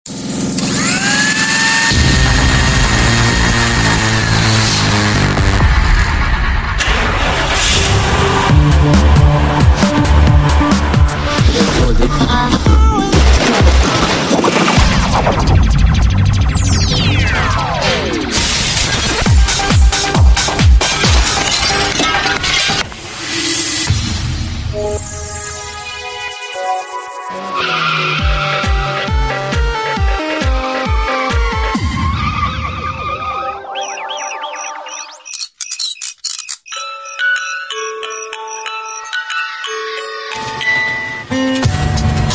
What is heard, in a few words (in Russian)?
Музыкальный ряд; для Заслушаем